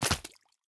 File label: minion footstep dirt; smite; npc; roman archer